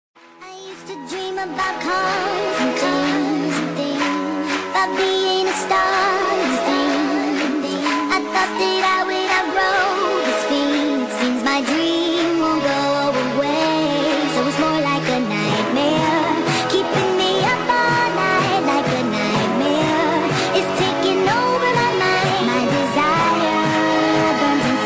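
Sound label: instrumental with hook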